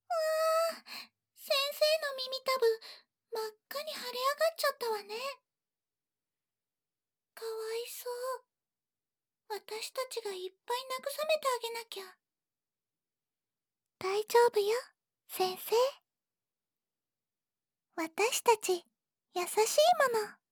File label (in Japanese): 耳舐めしながら手で擦って上げる